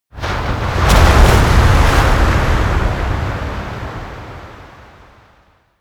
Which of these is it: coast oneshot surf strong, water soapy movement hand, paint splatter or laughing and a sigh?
coast oneshot surf strong